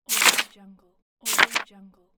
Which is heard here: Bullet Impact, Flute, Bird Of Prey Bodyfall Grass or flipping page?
flipping page